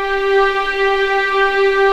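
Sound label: G4 legato piano, violins; East West; The Ultimate String Collection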